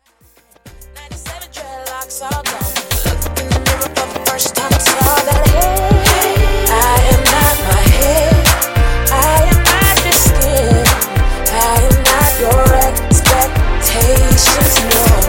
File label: 2000s HipHop RB Megamix 100-160 Bpm; Clean Dirty